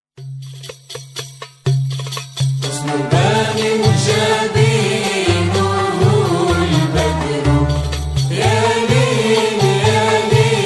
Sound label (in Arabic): موشح اندلسي Andalusian Arabic